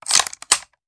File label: Machine Gun Foley; Sound Ideas; Guns